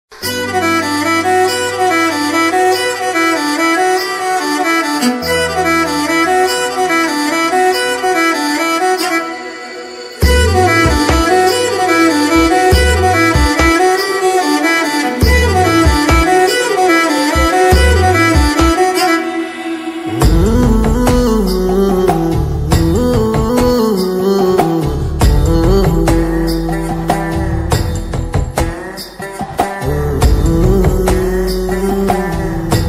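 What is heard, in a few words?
heart touching background music; bengal tiger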